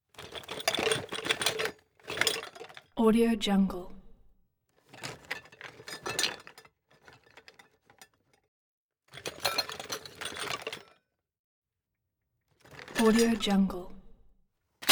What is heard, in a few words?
junk movement items inside canvas bag